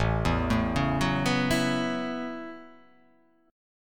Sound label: A 6th Add 9th, A6add9 chord; arpeggiated